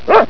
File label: bark; soe